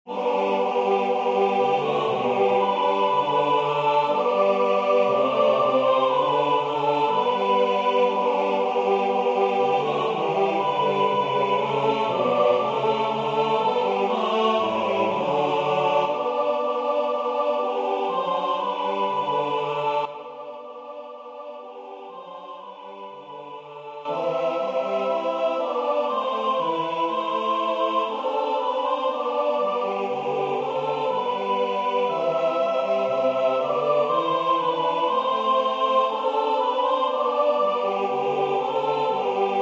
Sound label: Hark the herals angels sing Bas